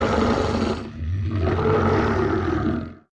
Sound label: werewolf grunt; minecraft; technic